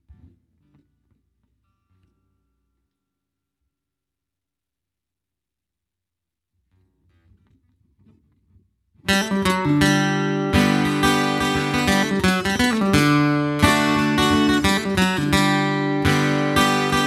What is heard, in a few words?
12 String